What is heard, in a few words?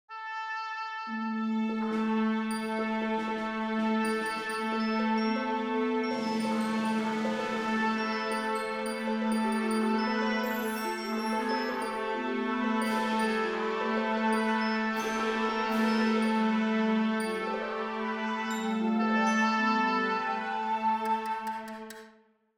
Tune Up With Conductor